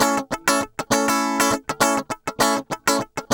100 BPM

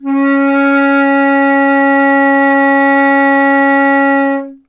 flute C#; Miroslav Vitous - Solo Instruments VOL-2; Solo lnst. II

